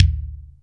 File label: Kick, Akai MPC-500; Toolkits; Kick TKit